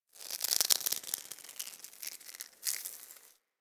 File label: Tendon Stretch Small Close; Ambience; DE; Warframe; Infested Eris